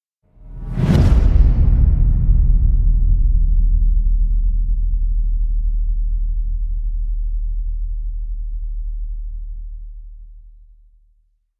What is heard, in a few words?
booms galactic with whoosh